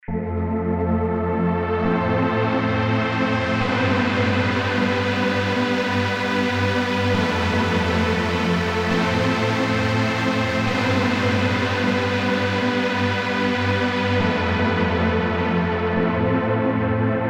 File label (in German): Modulationsrad; Hype Almighty